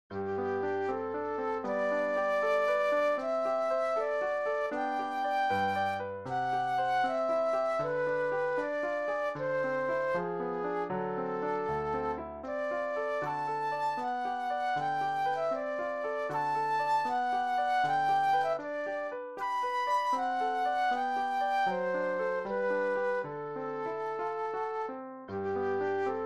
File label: Flute Solo; classical